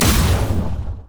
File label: lux finalesfunkeln; LoL